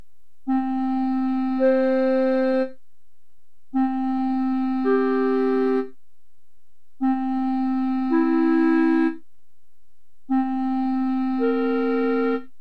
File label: partials